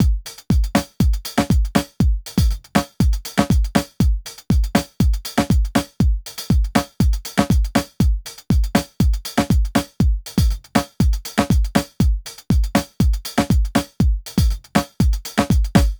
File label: 120 beat 4 bars, Nu Jazz House; PowerFX